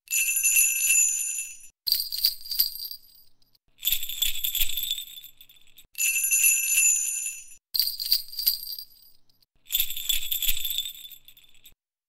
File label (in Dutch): Het geluid van de Belletjes; Muziekreis